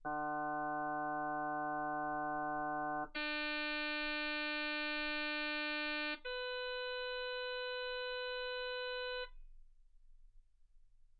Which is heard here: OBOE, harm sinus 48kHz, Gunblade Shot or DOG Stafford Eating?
harm sinus 48kHz